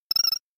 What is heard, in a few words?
dragon coin, collect 5 in a level for 1Up; Super Mario World; Mario Coin Sound Clips